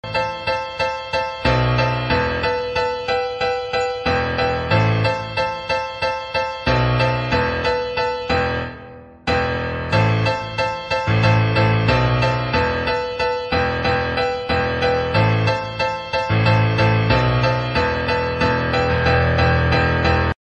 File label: piano ringtone